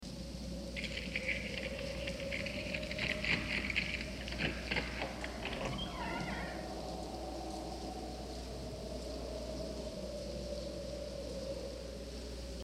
tür öffnen; Horrorgeraeusche

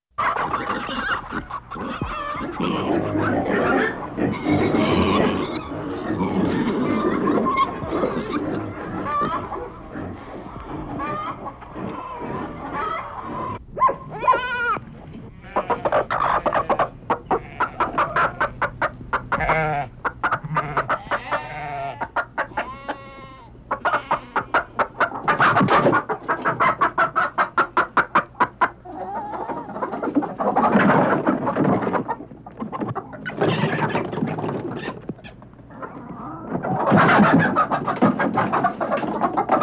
tiere auf dem bauernhof, Auf dem Land

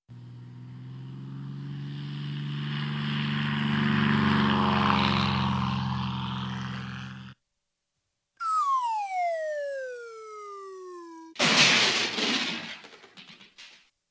einmotoriges flugzeug stürzt ab; Geraeusche; Katastrophen

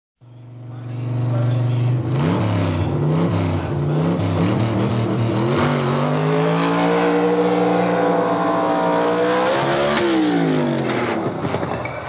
drag race - antriebswell bricht; Sport

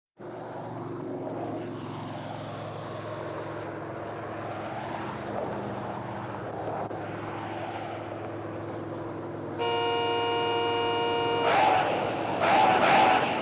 auto massenkarambolage; Katastrophen

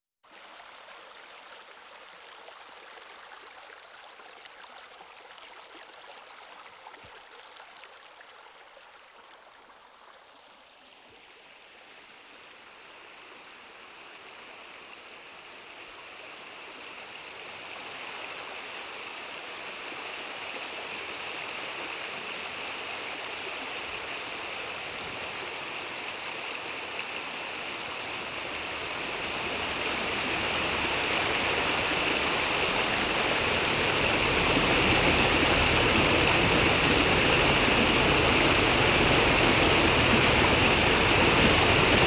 ruhige fluß schwillt an zu sinnflut, Katastrophen

